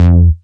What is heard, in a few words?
bass italo F, Bass Shots, Chicago House; Classic Chicago House